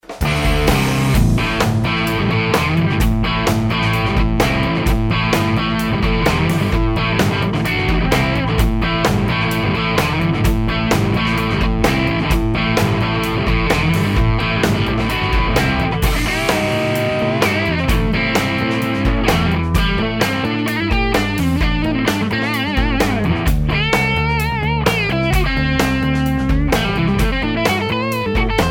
higain bridge neck, Tele; schwarzbach; VP-Tele-57

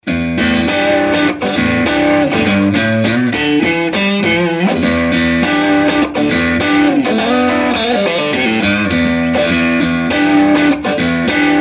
crunch neck middle, Strat; schwarzbach; VP-Strat-66

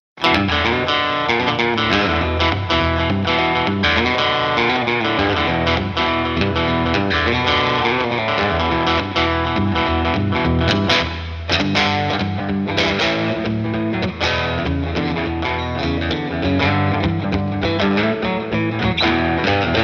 bridge, telecaster; MudCat

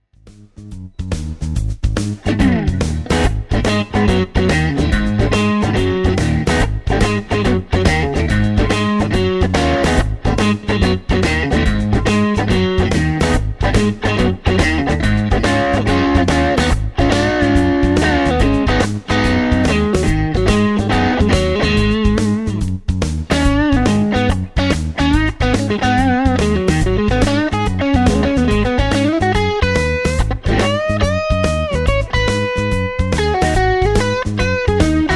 Crunch Neck Mid, Strat; Middle/Neck; schwarzbach; VP-Strat-IrishTour

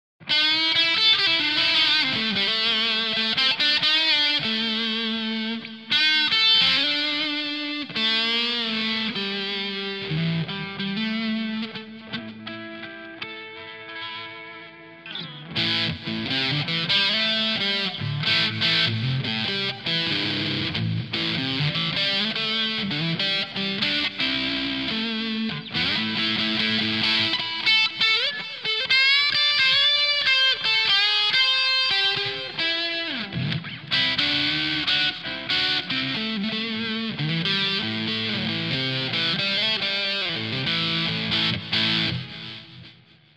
bridge blues, telecaster; MudCat; psycho

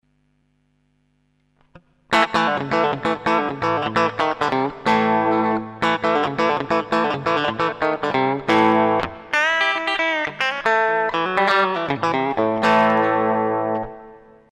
Bridge, telecaster; Fender Japan Tele; MCat

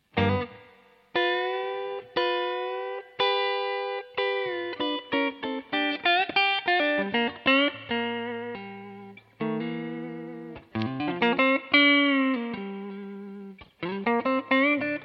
Neck A, telecaster; 60's Standard Set

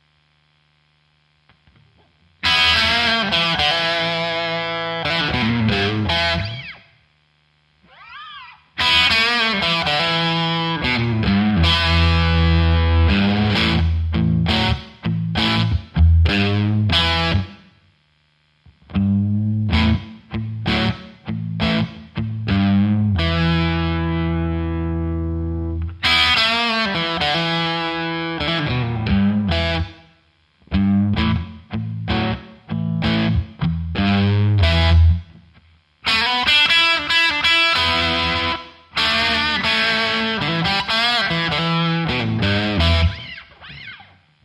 bridge, telecaster; MudCat

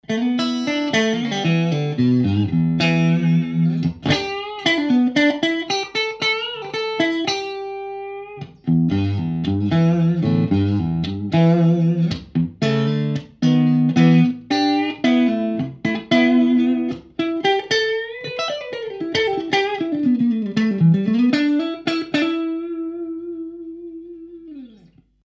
overwound clean middle; schwarzbach

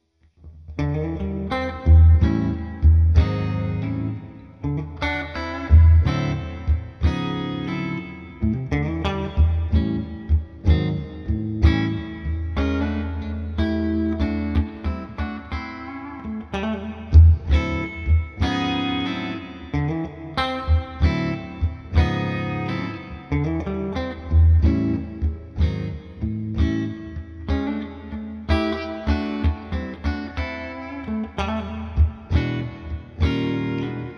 Fender Twin Clean, humbucker; Bridge; HardGen; Epi-Joe-Perry-Boneyard Les Paul, bridge position

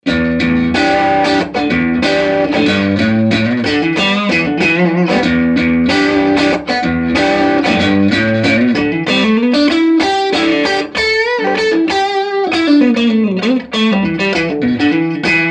crunch neck middle, Stratocaster Pickups; schwarzbach

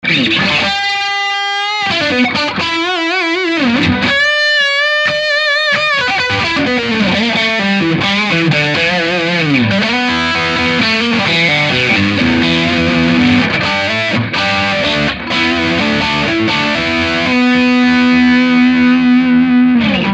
brett middle bridge, Strat; schwarzbach; VP-Strat-66

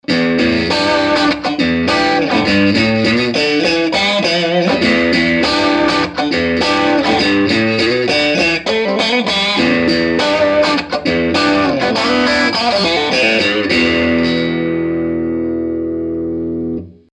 crunch middle bridge, Strat; schwarzbach; VP-Strat-66

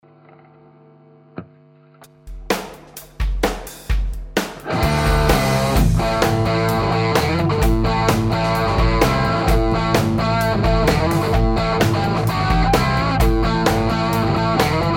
higain bridge, Tele; schwarzbach; VP-Tele-Pre55

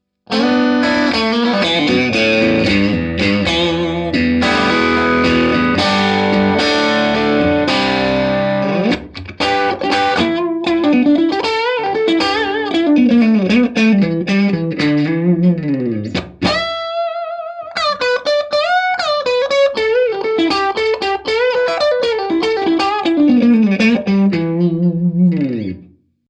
overwound crunch middle bridge; schwarzbach

